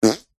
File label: human hands wet squeeze together fart noise